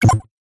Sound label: science fiction console feedback tone; zapsplat